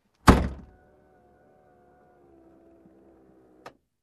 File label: Closing Car Door